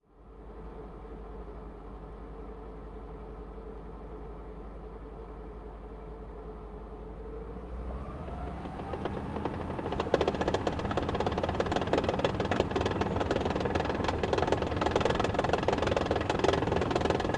m18 onboard medium driving reversing stopping tracks right; sonniss gdc